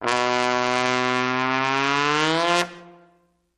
Trombone Medium Gliss Up

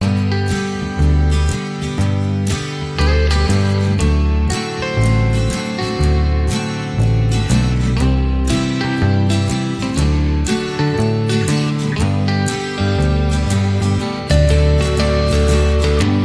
Inst No Drums

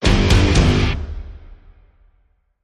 Stab Guitar Triple (G)

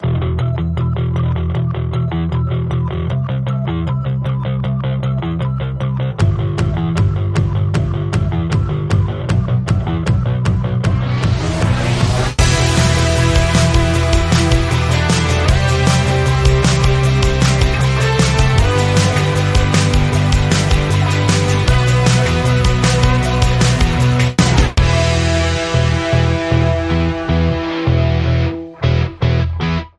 Guitar

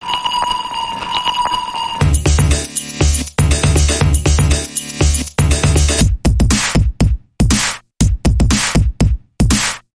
Scorcher Dance Bumper

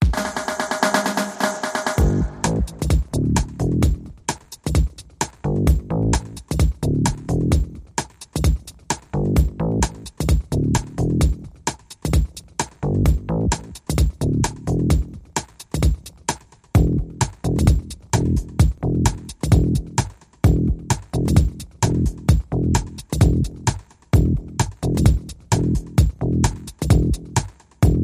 Steady Pulsing, Drum & Bass Only

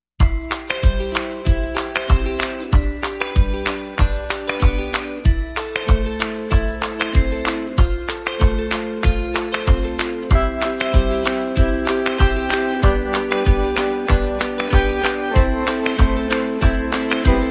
No Vocals

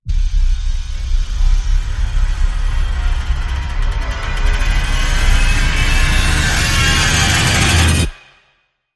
Afterlife Phantom Rise

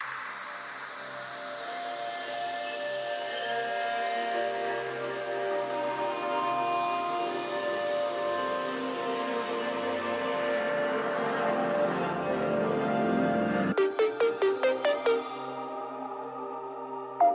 Ambient

